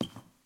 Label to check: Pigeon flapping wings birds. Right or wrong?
Wrong